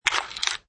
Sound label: Gear; Generic gear turning spinning sound - Variation 2; High-Tech; Multimedia